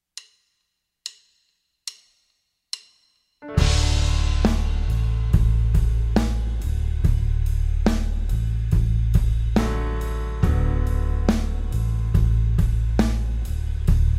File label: 70BPM D